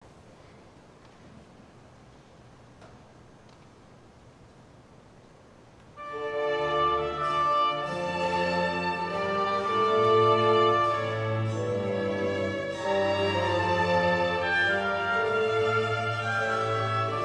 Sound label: Organ Concerto in F Op 4 no 5; Handel; First Presbyterian Church Bryan Texas